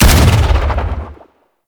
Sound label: Sentient Rifle Shot; Warframe; New War